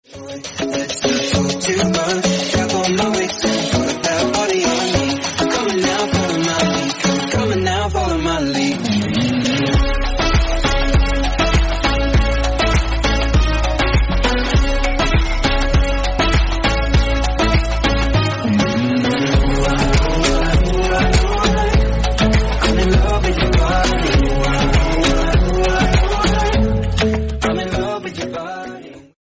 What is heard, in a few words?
dance ringtone